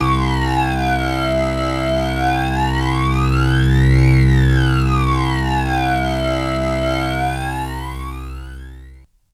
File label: MS20 Fuzz Flanger Vinyl Synths C1; vinyl leads